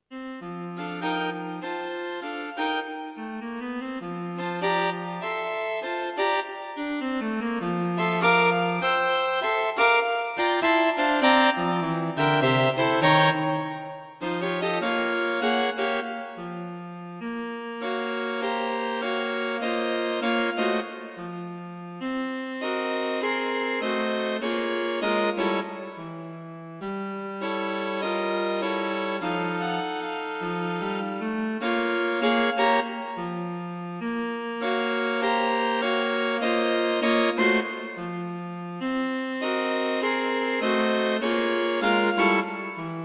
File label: String Quartet for Concert performance; A lazy jazz style.; Plenty of harmonic interest.